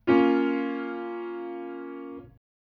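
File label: A sharp minor lower, chords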